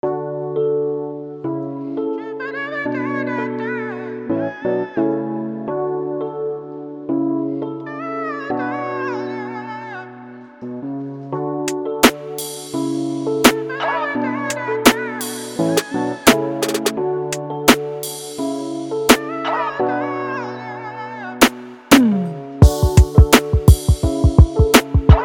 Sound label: Beat; COVID-19